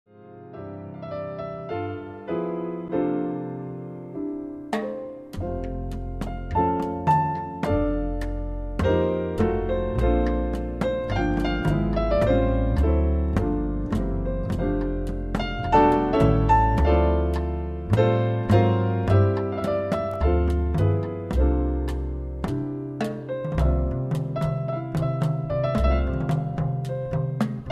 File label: Danzon